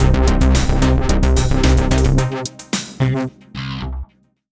MUSIC EFFECT Dubstep Positive stereo; switch windows gamerip 2018; dungeon stars

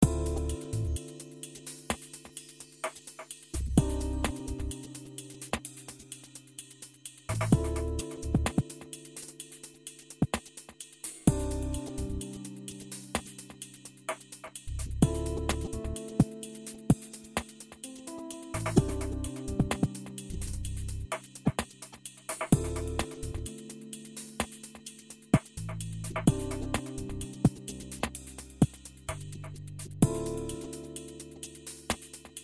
Clubsound

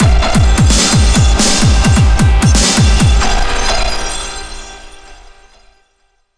Action Boss; PunkGirl

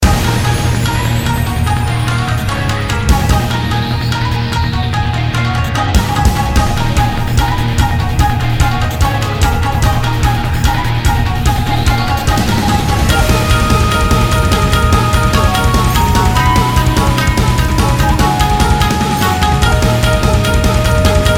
Raid Boss; mist train girls android ios mobile gamerip 2020

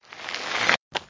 yard swipe; talking tom gold run gamerip 2016

